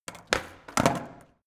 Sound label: skateboard indoors kick